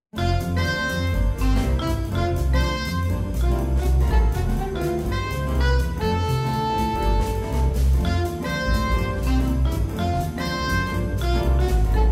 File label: Jingle